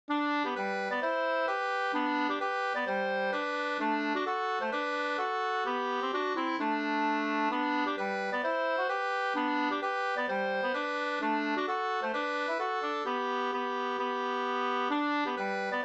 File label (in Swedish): schottis; Stockholmsschottis 2st